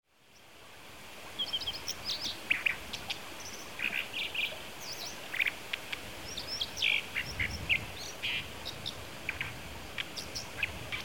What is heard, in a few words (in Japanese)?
kawagarasu, kitami, koe